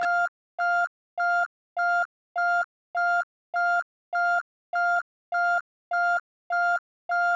reversing

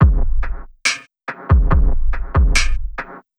DRUMLOOP

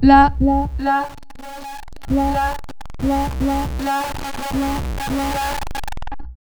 drumloop; Example1 la drumloopA

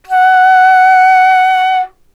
flute vibrato

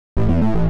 low ammo

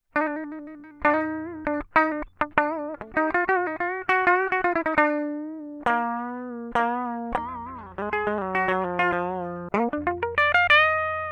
lead guitar

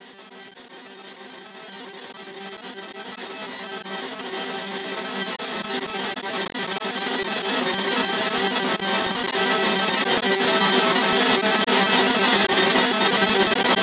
gtr FX

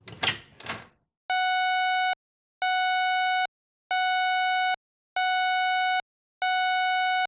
Alarms

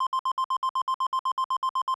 Out Of Ammo

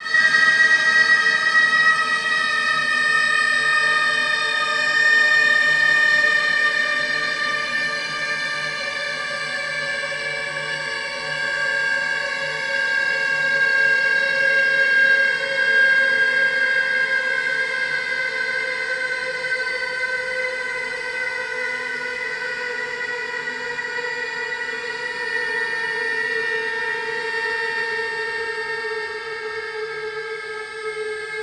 vln FX

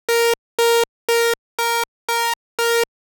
alert

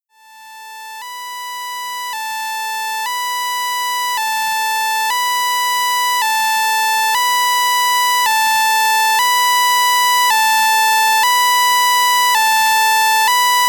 Water leak

